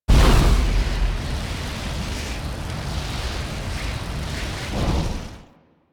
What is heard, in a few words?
Flame Vortex; Ambience; Props; Loops; Warframe; Eidolon Plains